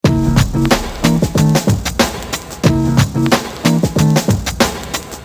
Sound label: depressing rock music